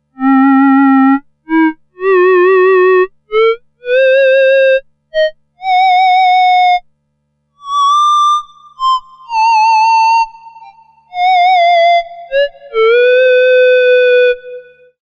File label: theremin